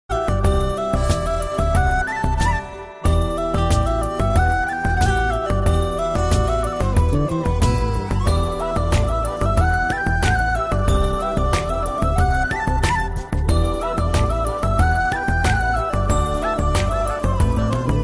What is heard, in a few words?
Flute Instrumental Ringtone